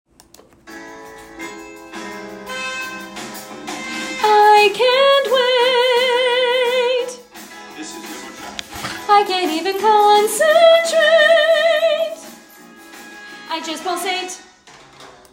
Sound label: Alto; Fame The Musical - Holiday Pack